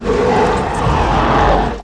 gurp; quake2; insect

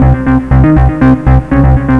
bass; quake2

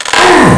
fall; quake2; coop

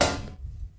cabinet; quake2; coop

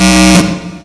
klaxon; quake2; mnhcoop

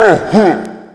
pain; quake2; Hueteotl

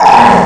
pain; quake2; coop

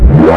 jump; quake2; redux; Hueteotl

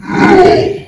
pain; quake2; coop; monsters; bossz

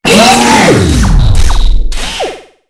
death; quake2; railz; tank

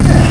bump; player; male; quake2; mnhcoop

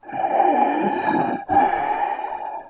gladiator idle; quake2; coop; bfggladiator

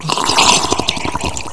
gurp; quake2; redux

